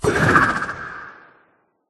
trident riptide; Minecraft

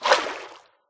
swim, fish; Minecraft

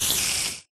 spider; mob; Minecraft

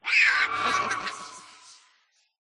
charge, vex; Minecraft

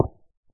stone, dig; Minecraft

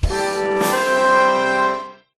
winner announce; Minecraft

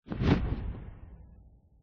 flap, phantom; mob; Minecraft

